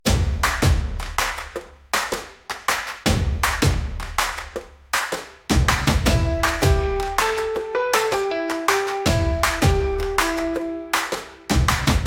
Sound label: Stomp CLAP